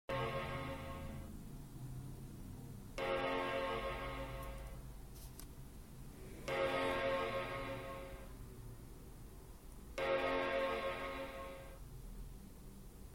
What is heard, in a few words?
chime loop mac